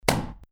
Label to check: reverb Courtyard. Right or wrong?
Right